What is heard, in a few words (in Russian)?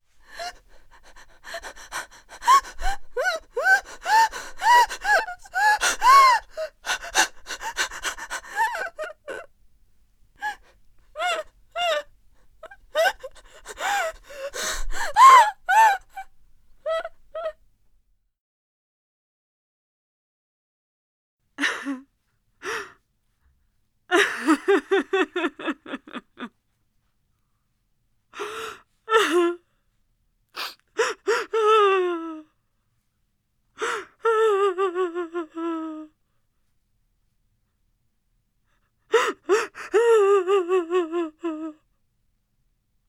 Rydaet devushka